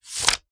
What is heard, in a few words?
Book